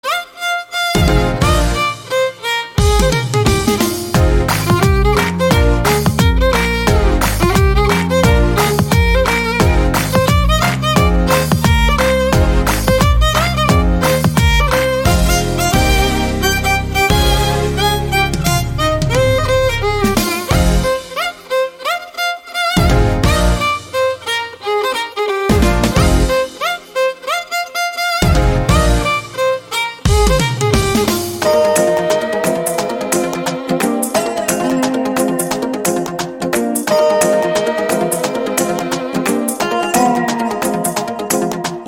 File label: Romedy Bgm; Etharkkum Thunindhavan; Suriya